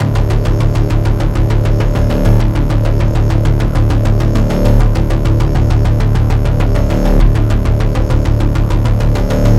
Trailer Pulse D 100 BPM; Immediate-Threat; Ghosthack

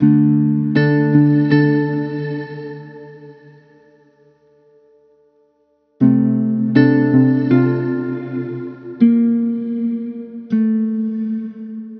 Musical Loop, 80, D Minor; Delusion; Ghosthack

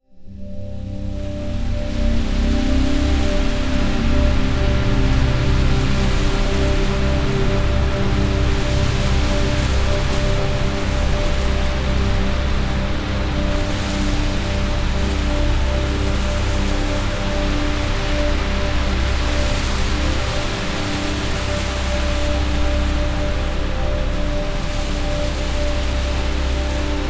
Granular Texture River Crossing Cm 70 Bpm WET; Ghosthack

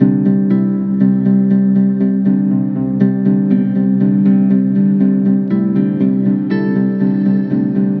Musical Loop 120 C Major; Atlantis; Ghosthack

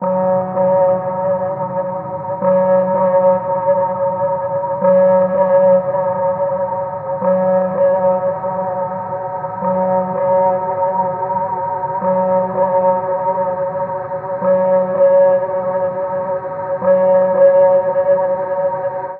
Drone F; Ghosthack; Eon

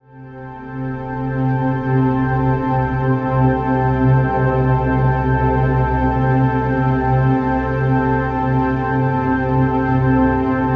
Atmosphere Obscured C; Ghosthack

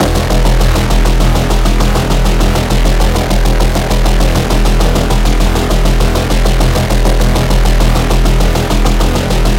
Trailer Pulse E 100 BPM; Cyber-Attack; Ghosthack

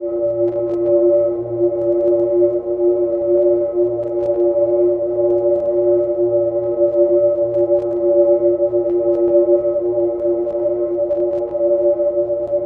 Drone A 100; Ghosthack; Astra

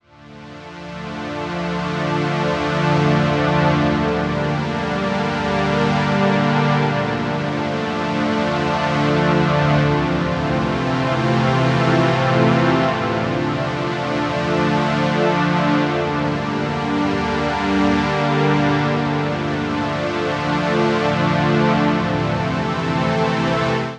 Musical Loop 80 Synth Strings D Minor; Ghosthack

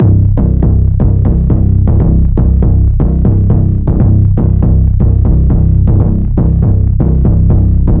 Trailer Pulse C 120 BPM; Getaway; Ghosthack

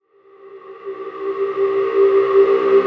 Riser C; Sonorous; Ghosthack

